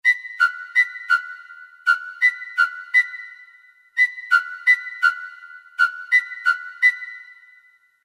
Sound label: Picolo Discord